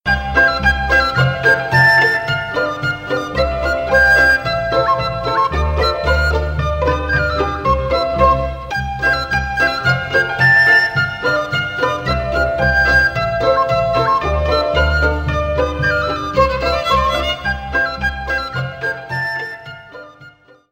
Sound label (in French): Kalamajka, Republique tcheque